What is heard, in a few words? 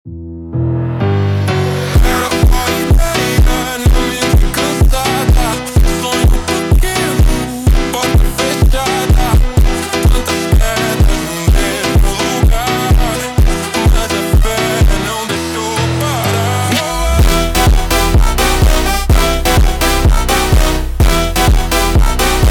Montagem slowed